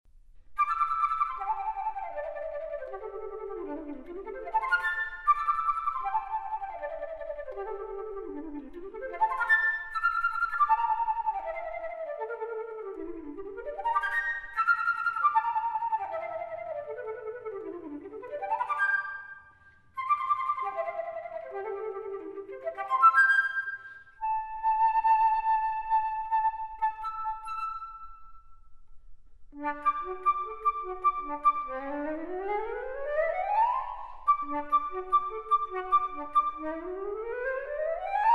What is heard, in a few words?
Flute; Carnival of the Animals